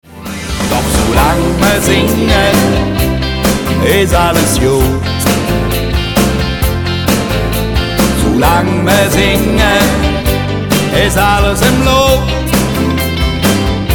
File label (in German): Sulang mer singe